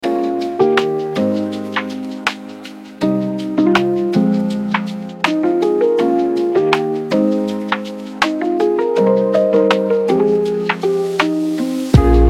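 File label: Chill Hip-Hop Beats, Chillout Lounge - Beach Lo-Fi; Pliazhnyi Lou-Fai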